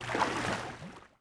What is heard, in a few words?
water wade